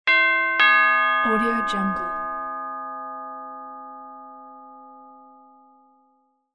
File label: chime door bell